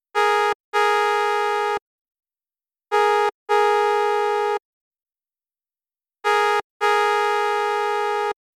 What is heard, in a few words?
Claxon